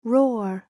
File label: Roar